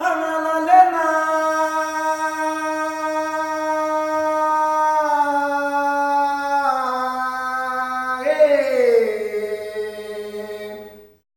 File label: MALE CALL; Spectrasonics; Heart of Africa 2